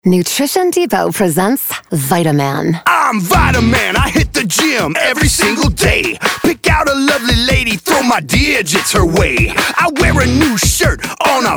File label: Jingle